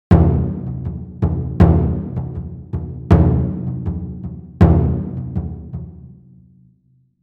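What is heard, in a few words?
Raised 2 semitones with pitch-shifting, Ohdaiko; taiko; TAIKO THUNDER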